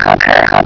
shocktrooper idle; hl1 npc

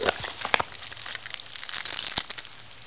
headcrab attack

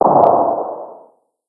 shotgun barrel distant; hl1 weapon

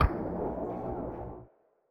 distant, gauss; hl1 weapon

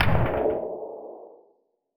shoot distant; hl1 npc; hassault

